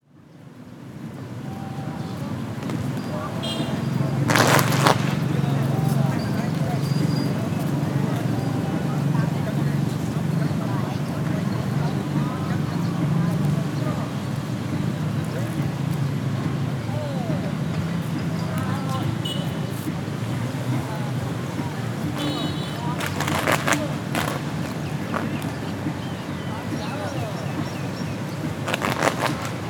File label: Taiji Shan on the river side Phnom Penh; CAMBODIA; Les Cartes Postales Sonores; NOISE ON EARTH Vol1